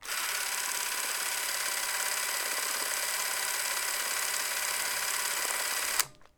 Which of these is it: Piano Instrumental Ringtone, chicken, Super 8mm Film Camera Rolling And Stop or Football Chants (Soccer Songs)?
Super 8mm Film Camera Rolling And Stop